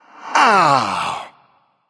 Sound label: ouch